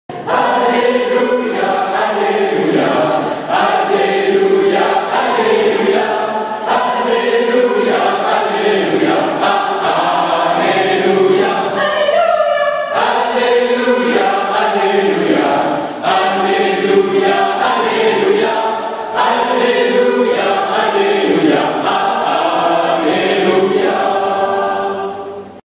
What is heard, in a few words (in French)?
Alleluia Africain